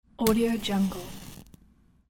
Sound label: matchstick sound